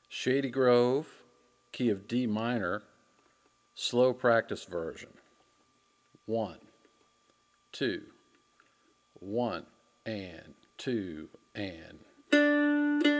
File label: Key Of Dm Practice Version; Shady Grove